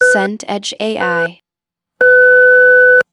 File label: ui countdown three